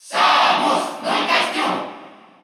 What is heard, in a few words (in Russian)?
Cheer Russian; Zero Suit Samus; SSBU